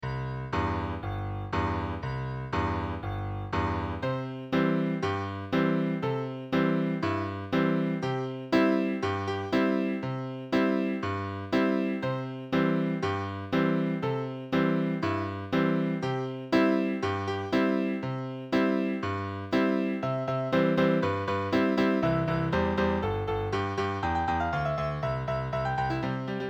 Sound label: foxtrottone midi file